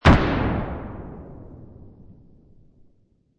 Explosion Blast Military, Moderately distant military explosion blast from bomb shell; grenade, flash bomb or mortar impact; Lynne Music